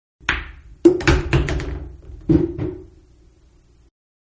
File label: Billiards Balls going in pockets And Clicking; Pool; Manhattan Production Music